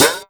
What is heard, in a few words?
HH OP; USB Soundscan vol.20 - Fresh Disco House I